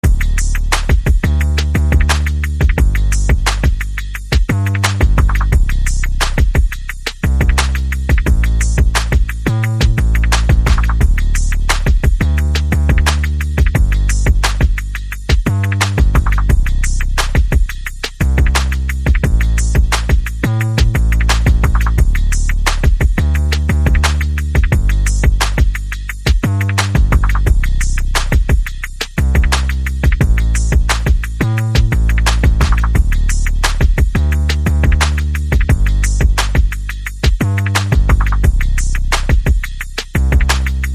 Percussion Bass and Effects

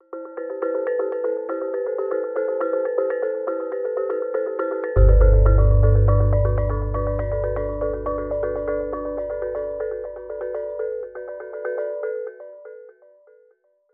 Minimal Gamelan

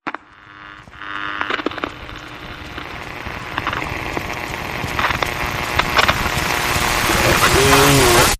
Intercom Rise

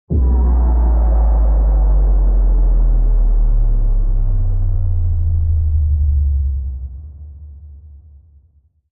Distant Drop

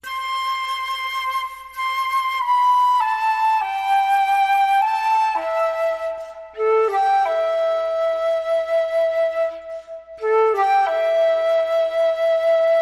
Flute

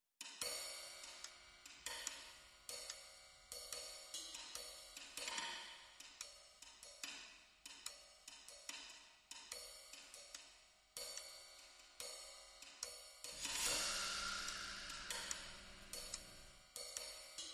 No Strings

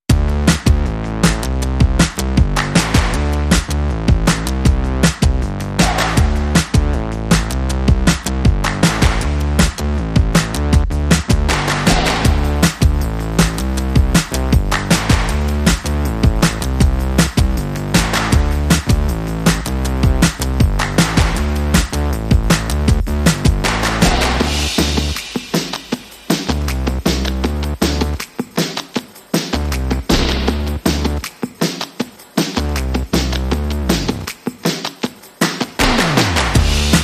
Rhythm

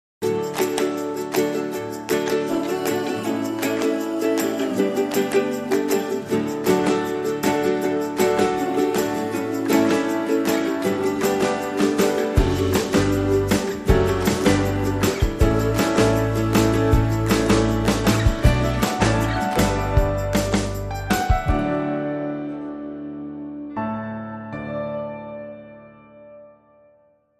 Fireside Jig

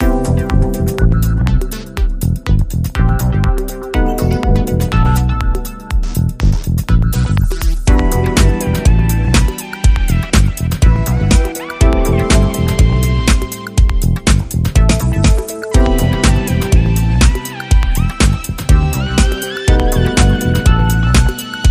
Transistor Disco

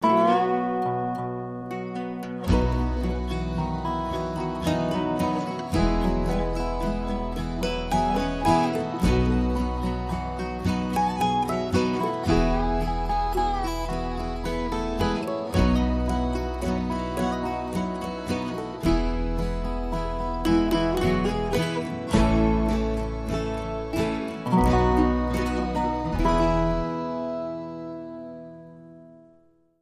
Mandolin Dobro Duet

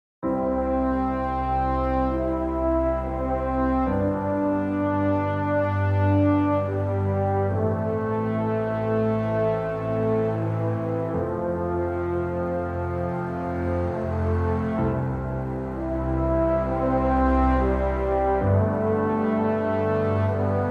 Legato and Piano

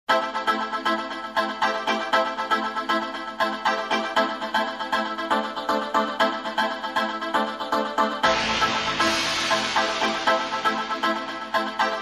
Engine

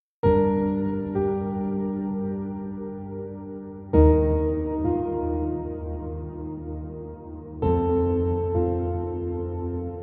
Piano Mix

